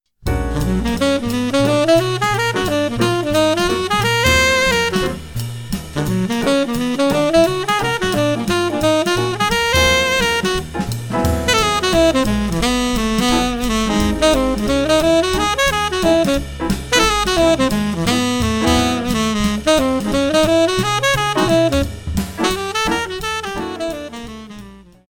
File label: Hip Licks for Sax tenor slow